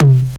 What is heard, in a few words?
tom, One-Shot Drums; Dubstep; Rimshots & Toms